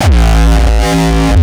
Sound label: kick; shock. Ultimate Kicks vol. 2